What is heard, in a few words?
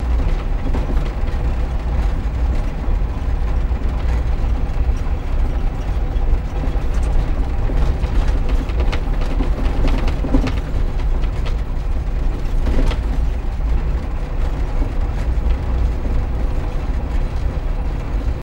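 English Taxi Interior Steady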